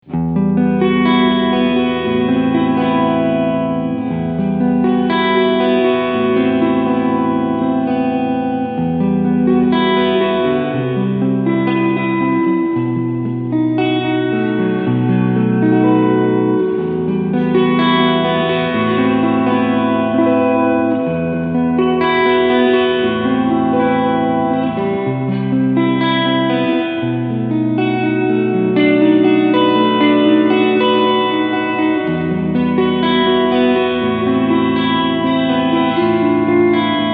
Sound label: Surfybear Reverb Cleansound